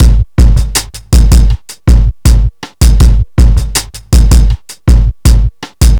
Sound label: Street Tip, R&B Drums